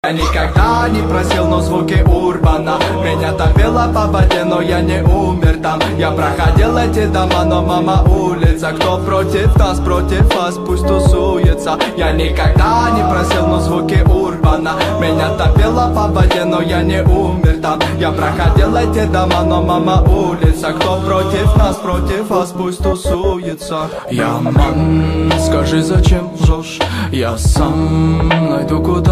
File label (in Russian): Звуки урбана